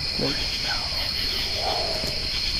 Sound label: possible chanting